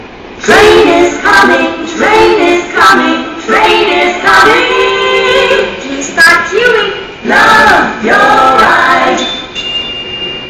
train; singa; alternative

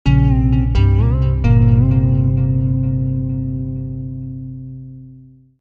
Octaved Guitar

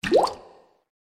Plop

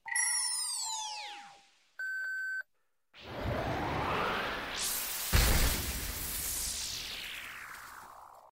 death tone; SAO

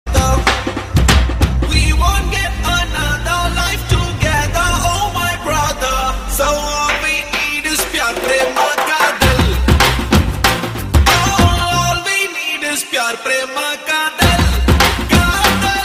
love anthem

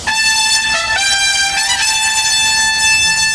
Flute

